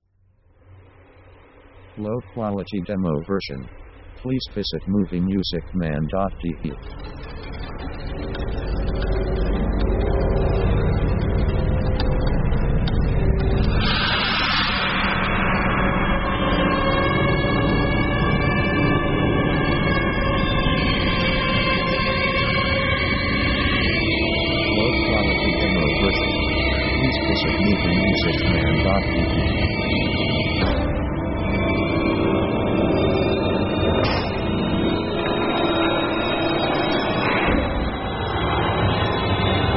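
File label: orchestra creepy horror; Dark mood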